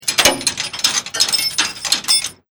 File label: Chain Metal Clanging